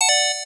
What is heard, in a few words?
Correct